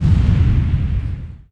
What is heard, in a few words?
blast